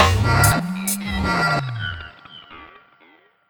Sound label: radio stinger